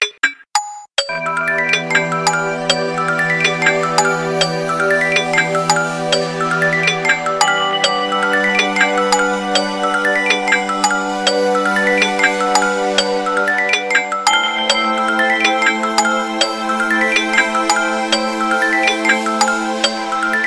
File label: Bright, ringtones